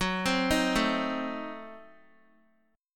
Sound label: Gb Diminished 7th, down, Guitar; Gbdim7; arpeggiated